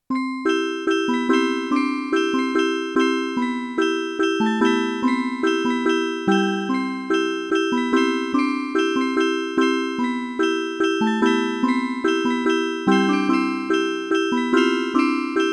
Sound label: orgel; 郷愁（オルゴール）; 古いカセットテープ音源